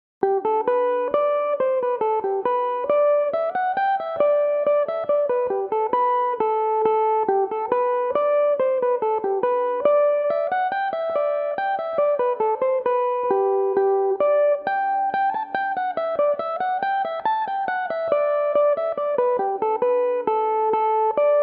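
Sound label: G major, Irish mandolin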